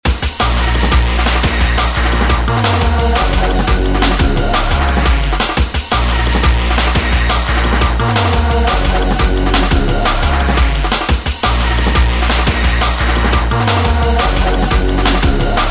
electro drum and bass

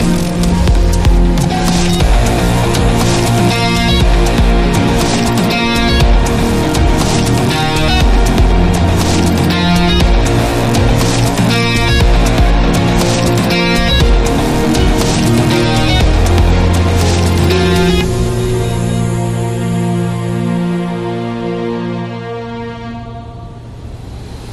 Hybrid Digital Orchestra; bbc

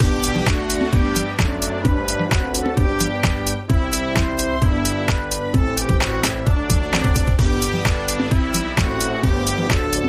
new zealand national anthem dance remix

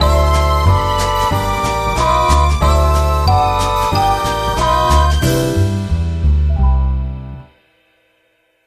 Nostalgic Love sting

